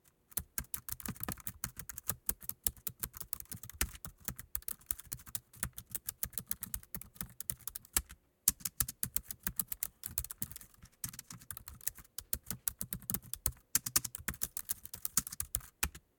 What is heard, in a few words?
Laptop Keyboard; Office Equipment and Supplies